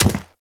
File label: Step Default; Warframe; Ballas Chimera